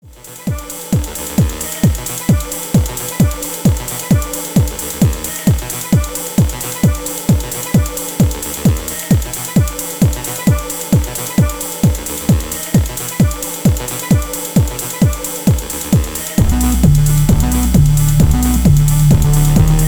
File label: Multi MIDI Out Arr; Future Retro Zillion